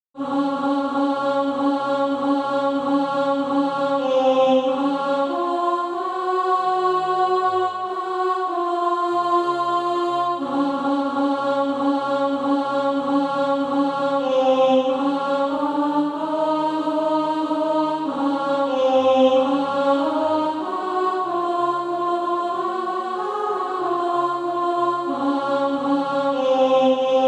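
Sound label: alto, Practise Music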